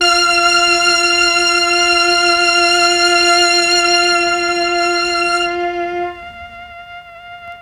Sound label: MEL STR, Legendary M400